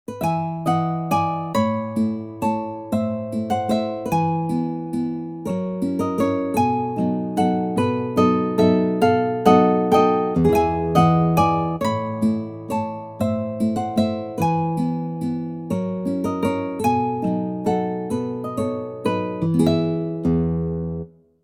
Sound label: Valse; Mujirushi Ryouhinn